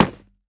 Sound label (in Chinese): 下界砖破坏声